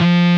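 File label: DISTORTED SYNTH BASS F-3; AKAI S-Series CD-ROM Sound Library VOL-7; STD SYN BASS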